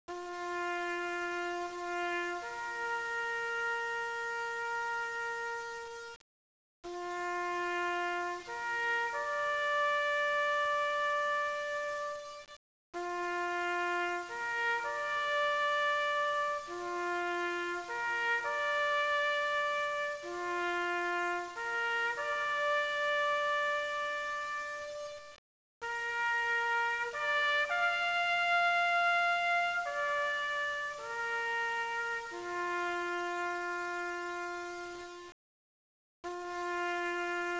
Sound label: taps; airforce